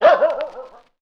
dog bark; Animals

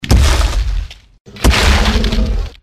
big walking, Animals

